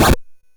Drums, Hits; DCM8

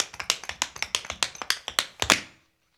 TAP, African Tap; Spectrasonics; Supreme Beats

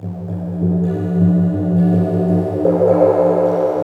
Tabla, 125 BPM; Zero-G; Pure Tabla

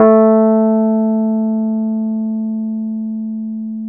RHODES EP A2; Sonic Reality; Vintage Timetraveler; RHODES EP2

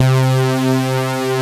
SAW, JV 1080; Pro-Rec - Synthsonic

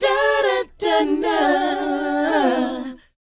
DOWOP A, Vocal R&B DOO WOP; ILIO; Vocal Planet

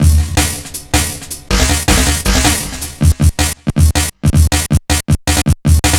160, Drum Loops; Total Drum&Bass

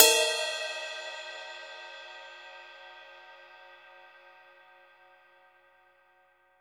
Drum Dry; East Collexion; Drum 1

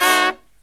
low chord, brass riffs; USB Soundscan vol.29; AKAI

